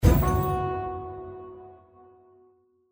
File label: horn; Champions of Valhalla